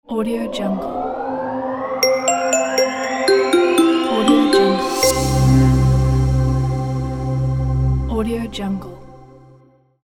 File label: opening logo